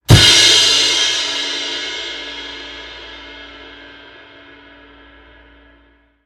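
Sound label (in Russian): вариант звучания барабанных тарелок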